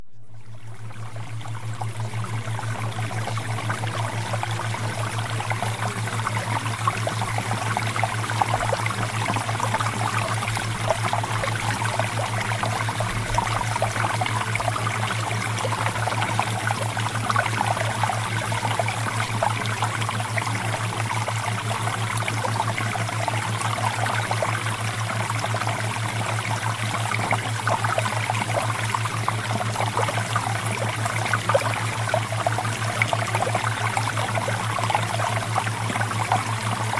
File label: Water Theta Waves; CLEAR SKIN